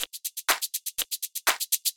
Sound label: 122 BPM Tops; mmb studio melodic